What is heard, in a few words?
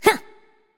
grunt jump; Smite; ymir v2 skin19